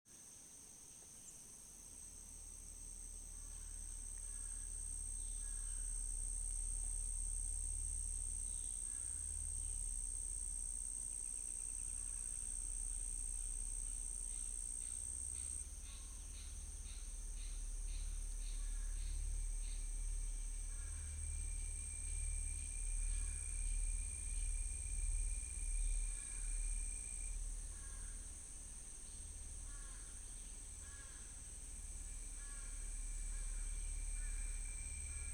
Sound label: Crickets Evolution Hard